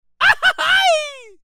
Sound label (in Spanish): GRITOS MARIACHI CORTO; ringtone